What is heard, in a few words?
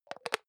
hygiene small cup close soft; haus-garten; badezimmer